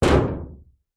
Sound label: impact metal door; zapsplat